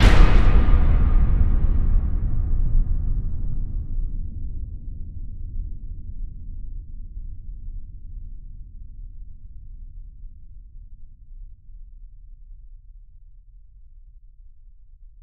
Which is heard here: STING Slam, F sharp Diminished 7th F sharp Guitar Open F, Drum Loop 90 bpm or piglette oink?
STING Slam